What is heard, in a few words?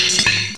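metal bong; duke nukem total meltdown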